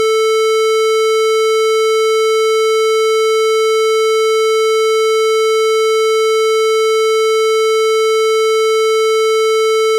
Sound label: 440 square